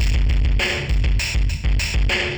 ind dist

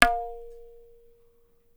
tabla; Dirt-Samples

